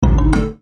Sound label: stop bonus; Book Of Lords